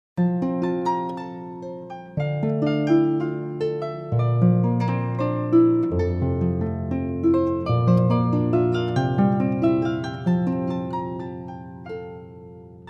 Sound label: Prelude, Harp; Si Bheag Si Mhor